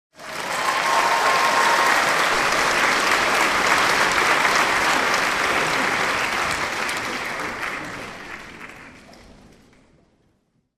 human audience theatre applause large

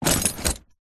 horse saddle leather metal set down jangle

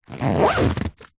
tent in bag zipper; foley; zapsplat

